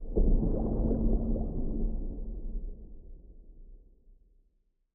spin Start

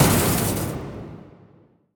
thunder Small; templeofnudges

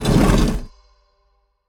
stone Door Open; templeofnudges

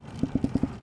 quick Spin; jack and beanstalk

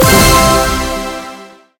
win big drop; dazzle mw

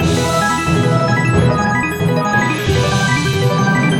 big win loop; jack and beanstalk

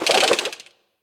sign Spin; strollingstaxx

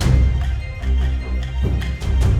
dazzle Free Spin Intro Loop; fortunerangers

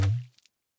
reel Stop Boing; strollingstaxx

